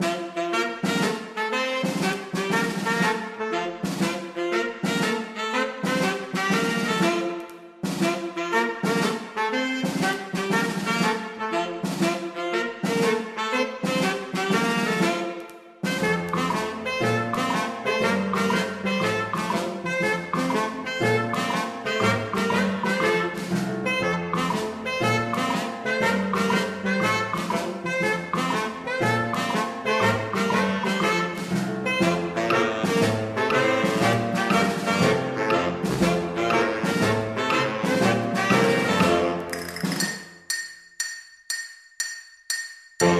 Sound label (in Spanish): Circo